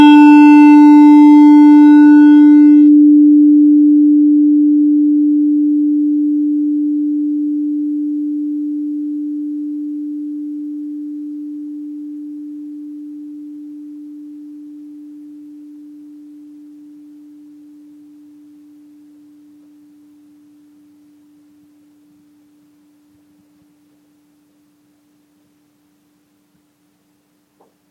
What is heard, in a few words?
tuning forks, fat cells